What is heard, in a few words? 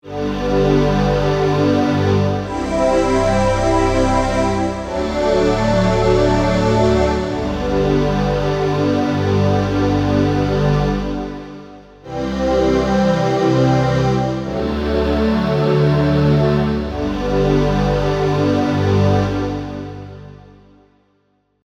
K1 Ahh Choir